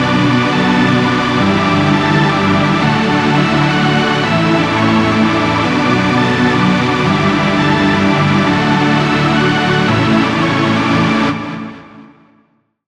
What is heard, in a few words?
Chord; Flame Elemental; Routed Sound; Vital Expansion - Flame Summoner Vol.1